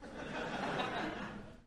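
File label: Crowd 100 Short Light Medium, medium interior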